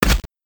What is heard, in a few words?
spear 8bit